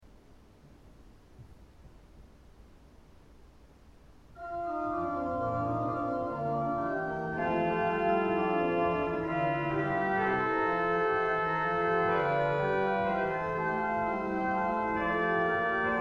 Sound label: Gottes Sohn ist kommen (organ); Orgelbuechlein